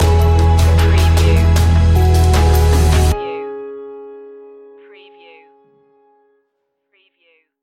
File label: timeless stinger